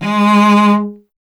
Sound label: STR VC G#0, Cello; Miroslav Vitous; Solo Instruments VOL-1